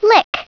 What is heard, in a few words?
lick